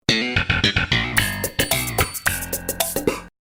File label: sitcom transition bass riff; seinfeld